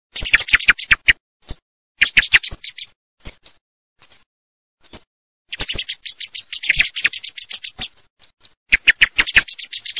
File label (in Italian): SCHIAMAZZO